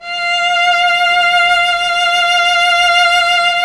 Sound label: STR VC. F, SOLO SECTION; Roland - LA Composer vol. 1